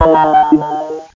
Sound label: talk